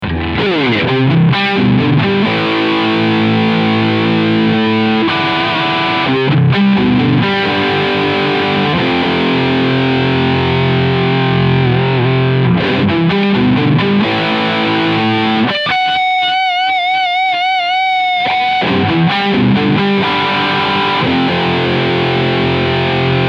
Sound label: Normal Channel Riff